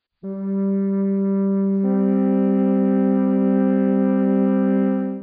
flöte g1 c2; Intervalle, die man durch Potenzen von 2 und 3 darstellen kann

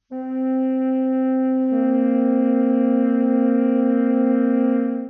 flöte c2 b1; Intervalle, die man durch Potenzen von 2 und 3 darstellen kann

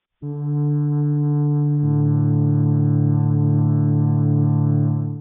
flöte d1 a; Intervalle, die man durch Potenzen von 2 und 3 darstellen kann

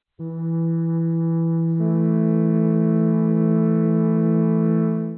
flöte e1 a1; Intervalle, die man durch Potenzen von 2 und 3 darstellen kann

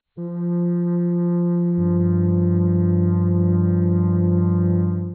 flöte f1 g; Intervalle, die man durch Potenzen von 2 und 3 darstellen kann

